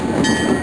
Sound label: station; 3DRMDemo